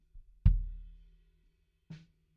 BD In

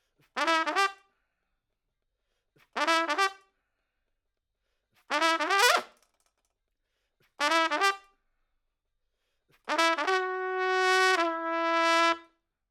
Trump

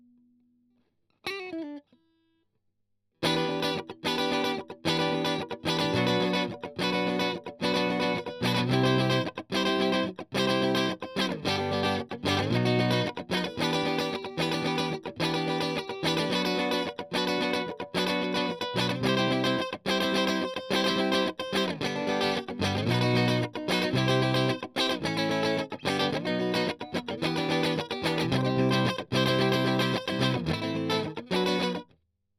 Strum

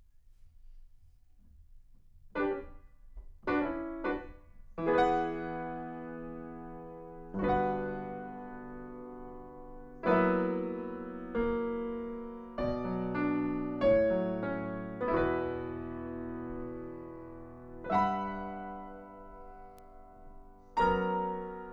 Piano Room

